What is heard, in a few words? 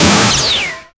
battle attack sharp and p; fast decay